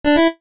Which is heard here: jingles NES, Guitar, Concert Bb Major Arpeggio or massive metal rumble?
jingles NES